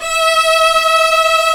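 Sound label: cello B; AKAI S-Series CD-ROM Sound Library VOL-2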